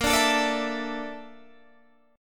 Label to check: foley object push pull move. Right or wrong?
Wrong